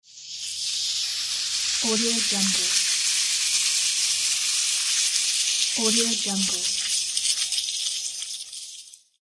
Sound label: soda fizz